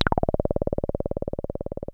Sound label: 303 C1, TB 303